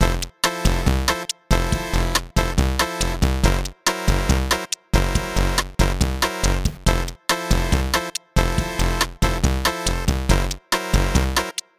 Background; Bomb Corp.; Jackbox Games; The Jackbox Party Pack 2

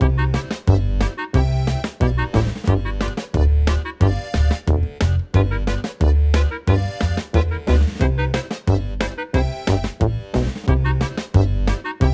Post Question Loop; YDKJ; The Jackbox Party Pack 5; Jackbox Games

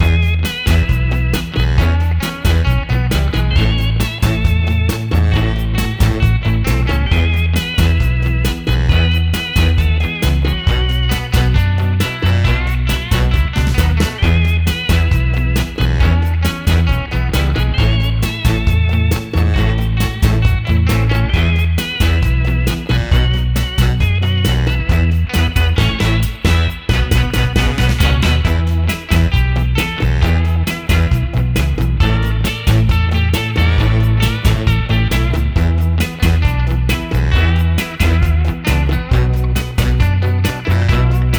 Background Music; Fakin' It; Jackbox Games; The Jackbox Party Pack 3

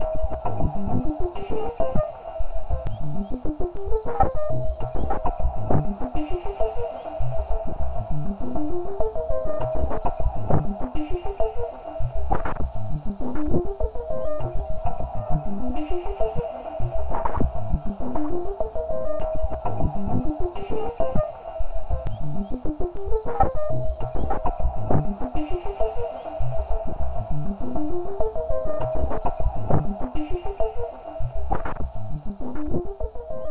Background Loop; Jackbox Games; The Jackbox Party Pack 1; LIE SWATTER

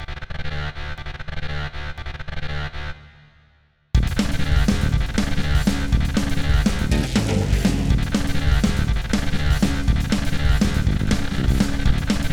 Gameplay; Bracketeering; Jackbox Games; The Jackbox Party Pack 4

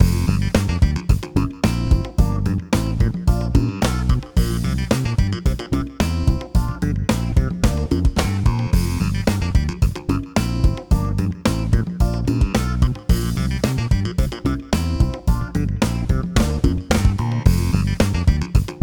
Post Question Loop; YDKJ; The Jackbox Party Pack 5; Jackbox Games

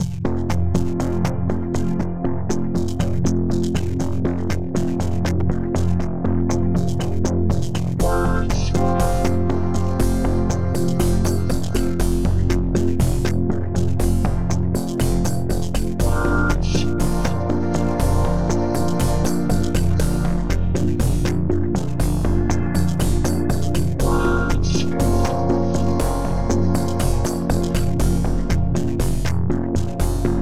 Lobby Music; Jackbox Games; The Jackbox Party Pack 1; WORDSPUD

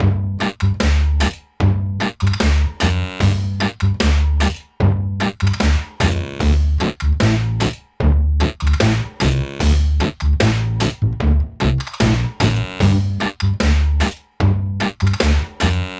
Background Loop; Quiplash XL; Jackbox Games; The Jackbox Party Pack 2

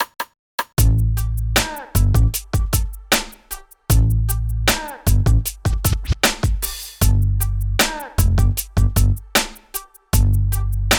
Rap Loop; The Jackbox Party Pack 5; Jackbox Games

